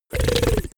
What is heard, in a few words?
monster snoring short